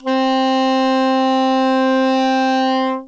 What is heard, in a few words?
Sop Sax No Vib ff C4